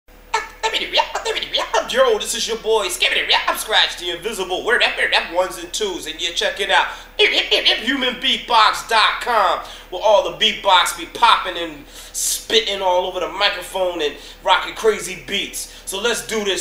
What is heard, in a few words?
scratch drop, human beatbox